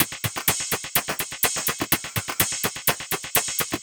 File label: Hats, Drum Loops 125bpm, Nu Dance Electro